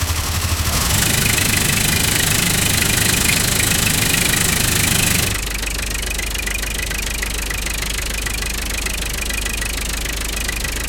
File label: door bell engine revving